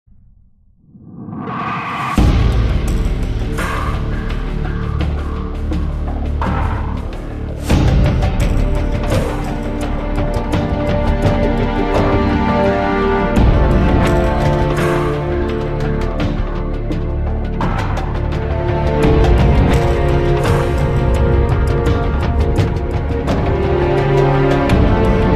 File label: zombie horde